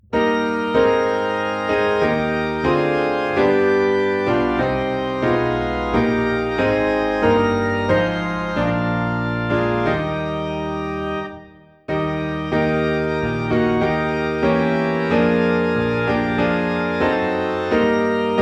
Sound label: I Heard the Bells on Christmas Day, Piano and Organ in Eb